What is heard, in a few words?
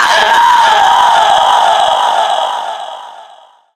Siren Infected; zombie plague